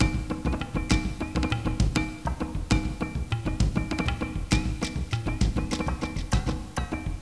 MENU LOOP; CIV2

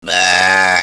BURP F#; Beavis and Butt-head in Virtual Stupidity

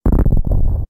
Bomb Explosion; Metroid (USA)

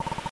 Lava Damage; Metroid (USA)

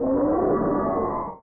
Boss Scream; Metroid (USA)

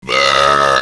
BURP C#; Beavis and Butt-head in Virtual Stupidity

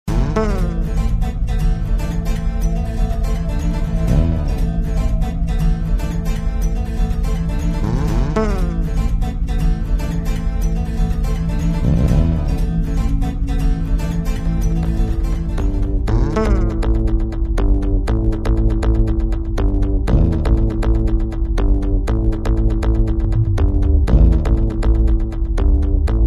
ambient howl; Hyperspace Delivery Boy

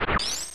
Ice Beam Shot; Metroid (USA)

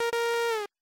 Incorrect Password; Metroid (USA)

